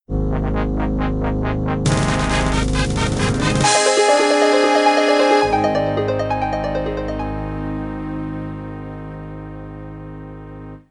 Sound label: Fanfare; Rise of the Triad; Dark War; Apogee